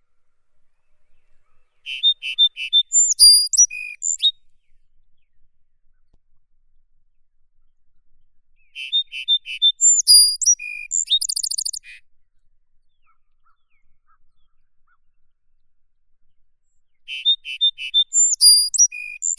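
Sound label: song sparrow, Melospiza melodia; ovsyanka pevchaya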